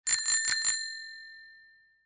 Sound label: Bell Bicycle Short Ring